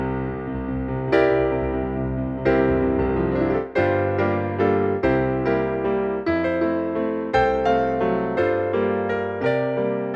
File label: Accompaniment